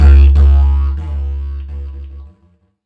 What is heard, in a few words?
Didgeridoo Kit Samples